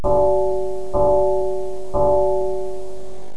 Chime

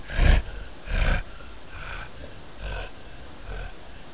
growl; Quake; PainKeep

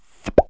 thhh pop; Wired for Sound Pro CD; Aristosoft, Inc.; pops

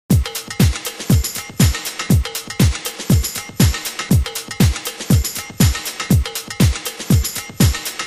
loop house; WMBonusXP

